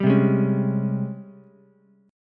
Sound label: Ab Major 11th Ab Ukulele C6; AbM11 strummed; JGuitar